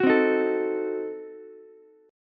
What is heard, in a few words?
D sharp Diminished 7th D sharp, Ukulele; D#dim7 strummed; 11,12,11,12